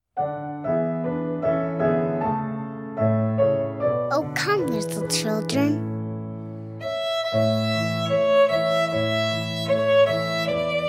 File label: Folk Song, Violin; O Come Little Children; Suzuki Violin Method - Vol. 01